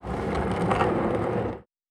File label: Wood Move; Survival Game Sounds - Lite Edition; Environment